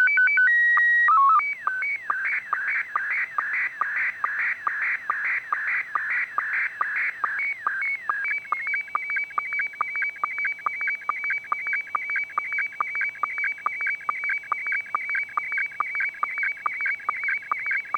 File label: sstv; pa3eki